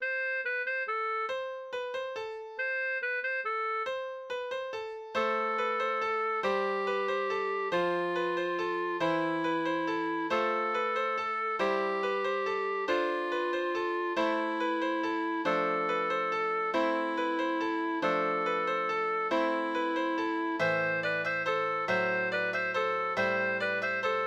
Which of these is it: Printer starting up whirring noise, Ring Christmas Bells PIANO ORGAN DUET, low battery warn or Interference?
Ring Christmas Bells PIANO ORGAN DUET